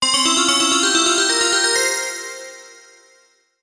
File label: Win; Temple of Luxor